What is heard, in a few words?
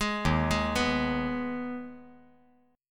D sharp Suspended 4th Sharp 5th